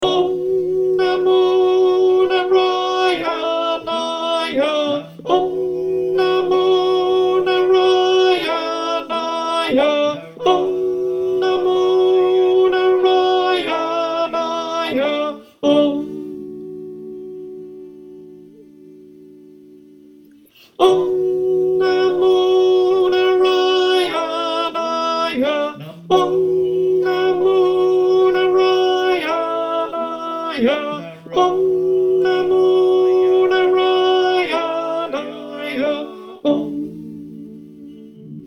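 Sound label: alto, choir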